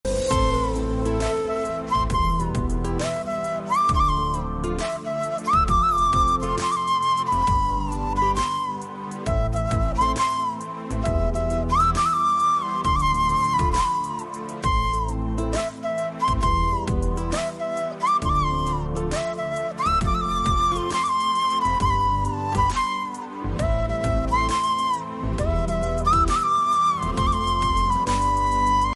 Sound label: instrumental music